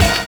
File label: ORGANUS; Univers Sons